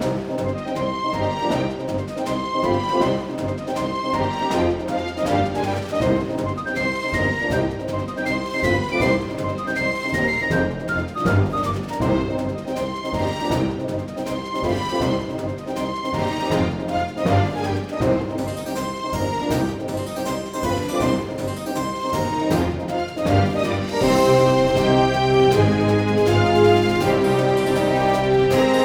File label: Shipwreck Beach Combat Loop; Curse of the Sea Rats